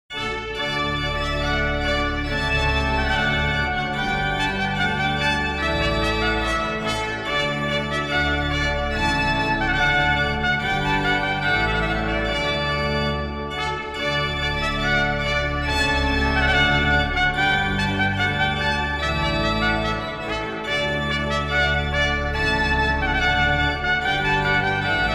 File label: Fanfare, Te Deum in D; Charpentier; H 146